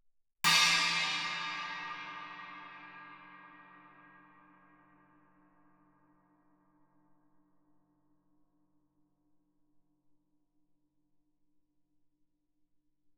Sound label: chinese stick shoulder ff, suspended cymbals; Percussion